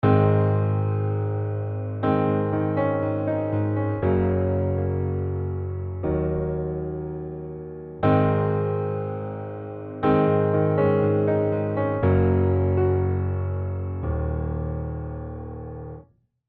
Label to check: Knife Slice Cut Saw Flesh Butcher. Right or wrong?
Wrong